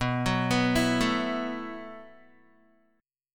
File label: B 7th Suspended 4th B, Guitar; B7sus4; arpeggiated; 7,9,9,9,9,x; 4E,4A,5D,5G,5C,6F